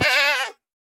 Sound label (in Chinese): 山羊受伤声